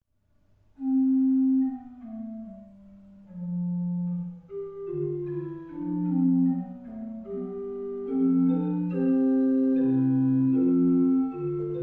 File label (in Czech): ukazky positiv; hudba